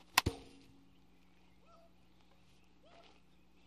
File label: Baseball Bat Wood Hi; baseball fx & games